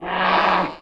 wram hit; sinfar haks; sf effects

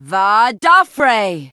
chant; sinfar haks; sf effects

